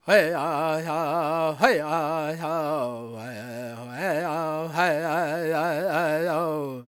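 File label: E minor, World Voices, Lakota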